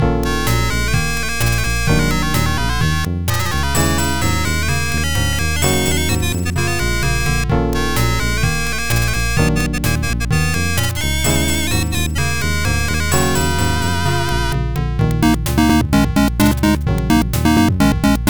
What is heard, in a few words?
high score